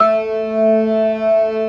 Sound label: ORGAN, C3 PERC; Keith Emerson Signature Series